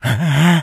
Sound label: become alert, infected